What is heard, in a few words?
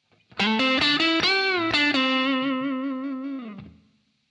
string bend